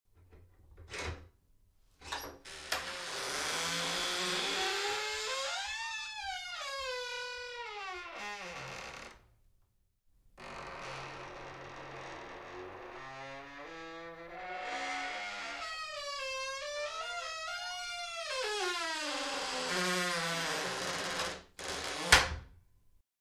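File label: Toilet Door Slow Open Close, squeaks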